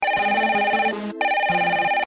Tel Tone

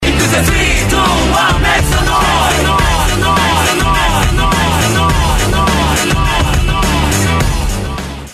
Make Some Noise

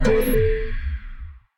Auto Save

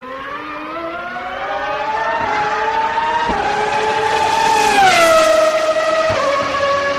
Indycar

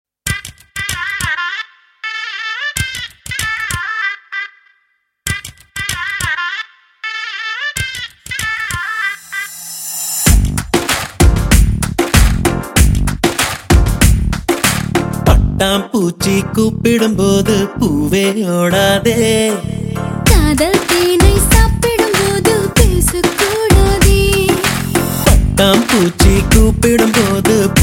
Bgm; Vijay; Kavalan

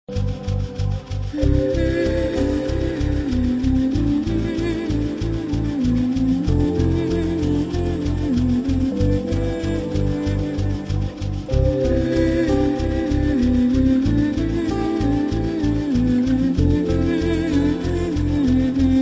Raga

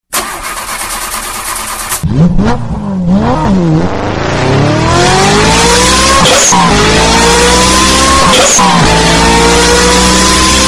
Carsounds

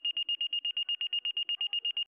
Tone Alert; APX

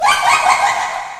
giggle; Marx

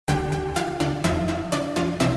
Jumpy Short Sms

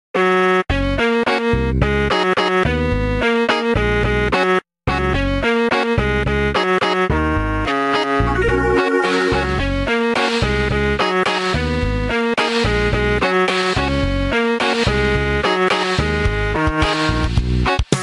midi

